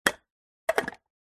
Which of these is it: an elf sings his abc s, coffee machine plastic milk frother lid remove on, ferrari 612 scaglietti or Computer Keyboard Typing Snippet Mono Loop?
coffee machine plastic milk frother lid remove on